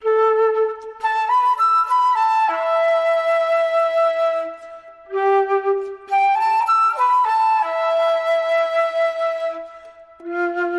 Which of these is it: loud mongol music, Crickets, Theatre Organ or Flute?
Flute